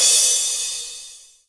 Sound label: soft hit finish